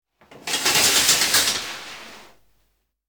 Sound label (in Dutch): Douchegordijn Dicht; KMP Film Video Sound Effects